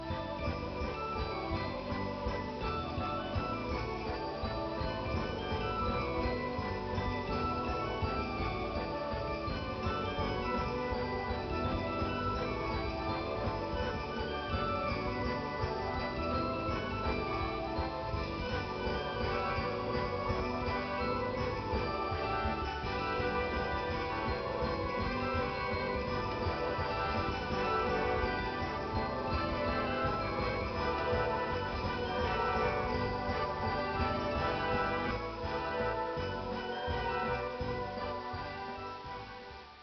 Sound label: town jingle christmas